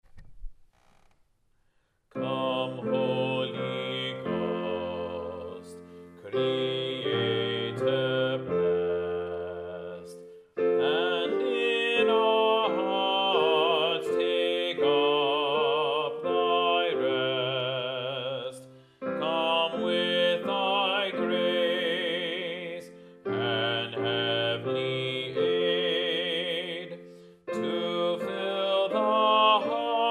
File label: Bass; Come, Holy Ghost